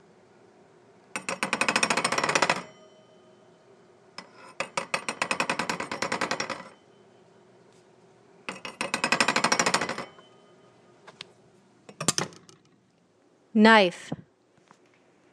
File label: knife; soundsemioticsofthekitchen